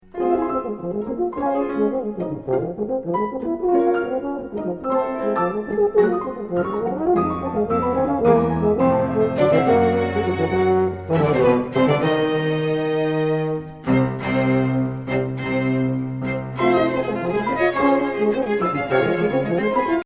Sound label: Euphonium, String Quartet & Piano; Martin Schmid Blechbläsernoten; Euphonium Concerto